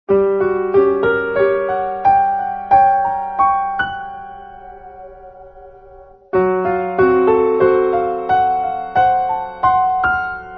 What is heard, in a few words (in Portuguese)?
tenso piano